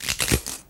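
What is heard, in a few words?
bone crack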